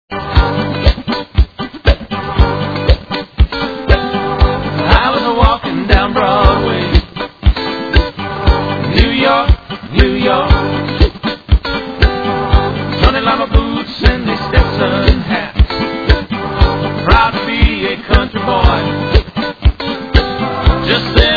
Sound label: reggae